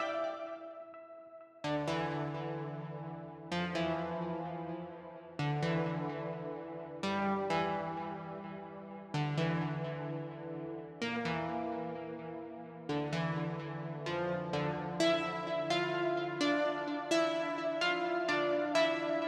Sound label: Doom Mix Stem Loop Layer 3